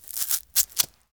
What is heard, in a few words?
Rip Hair; Fairlight Sound Lib